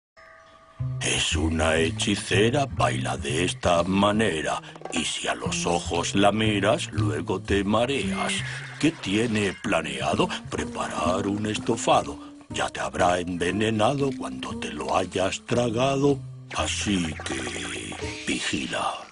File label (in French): song, French; Flutterguy Enchantress song; Season 1